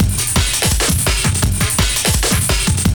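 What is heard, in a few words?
drum loop; X-Static Goldmine 3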